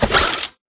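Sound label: Tick Movement Footstep Jump; Titanfall 2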